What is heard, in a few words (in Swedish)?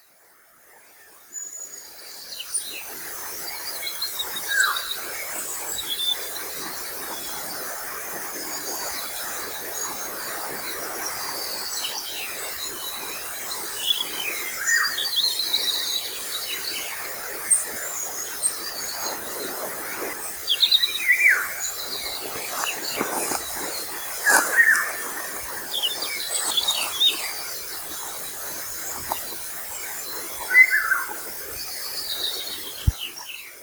sommargylling; fågelljud